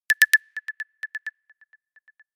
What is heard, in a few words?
notification pager